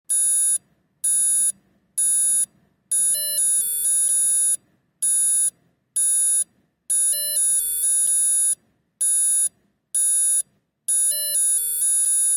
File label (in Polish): pokaz dzwieku alarmu